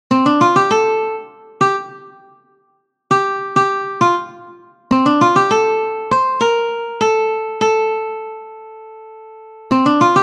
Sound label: ukulele jazz song ukulele